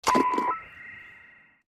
Quest Finished; Custom UI Sounds; Call of Duty Modern Warfare (2019)

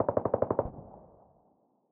aug burst long, weapons; SPT Battle Ambience

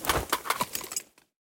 Backpack Open; Custom UI Sounds; Insurgency Sandstorm

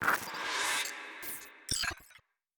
Quest Sub Track Complete; Custom UI Sounds; Cyberpunk 2077

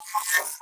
Button Bottom Bar Click; Custom UI Sounds; Call of Duty Black Ops 3

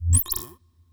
Menu Modding Close; Custom UI Sounds; The Division 1

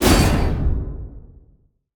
Button Click; Custom UI Sounds; Elden Ring

